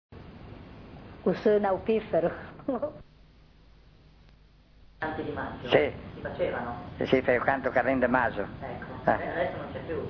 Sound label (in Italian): ottone